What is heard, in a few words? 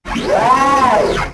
servomove; weapons; galak